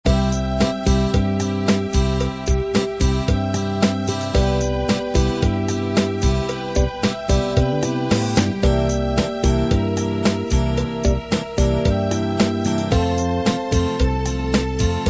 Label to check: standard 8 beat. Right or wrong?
Right